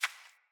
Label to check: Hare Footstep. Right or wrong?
Right